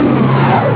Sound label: down